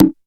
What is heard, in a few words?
Clook One Shot, SFX; Sonic Foundry (Sony Creative Software); Electronic Point Blank